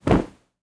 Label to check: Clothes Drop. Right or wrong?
Right